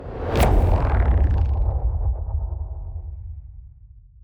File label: Attack; neptune